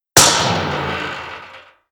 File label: Spring Action Arm Off